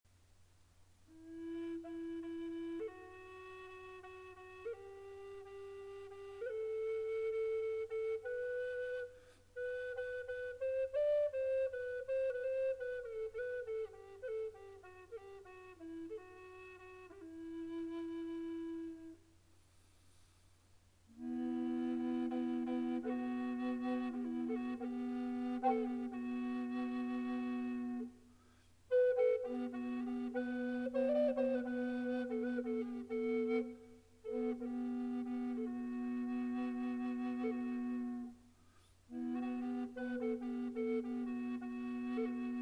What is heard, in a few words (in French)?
flute contre bass MI SI 440, flute amerindienne contrebasse